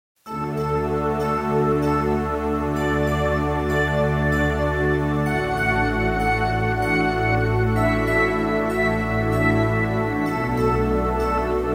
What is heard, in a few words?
Happy Mood Ambient